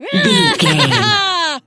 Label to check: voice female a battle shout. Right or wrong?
Right